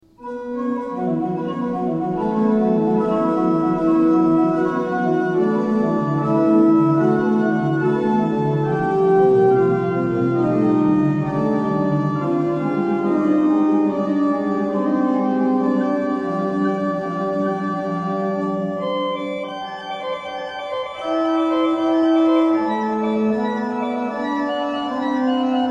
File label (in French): orgue